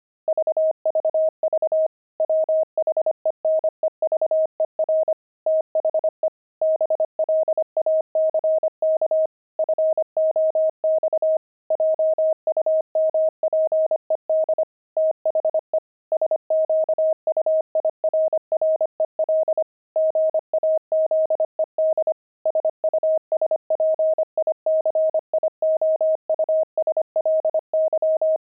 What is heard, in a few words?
pangram, intermediate pangrams files